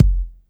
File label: kick sample f key